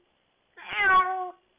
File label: cat meow